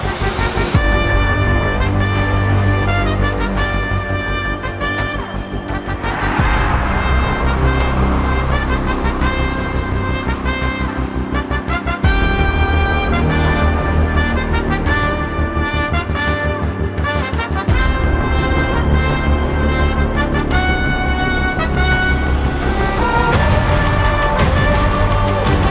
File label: Bgm Ringtone